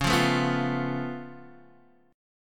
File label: C sharp Augmented 7th C sharp Guitar DADGAD; down; chord shape